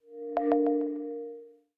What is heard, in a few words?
Usb; OnePlus; ui; OnePlus 11